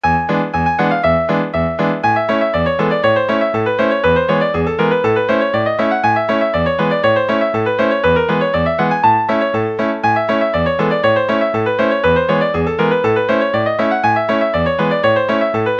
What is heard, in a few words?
concertina cocktail polka